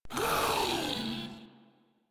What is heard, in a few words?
Vocal Pain; Warframe; Proto Infested Ancient